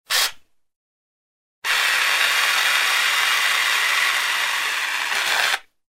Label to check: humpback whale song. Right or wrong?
Wrong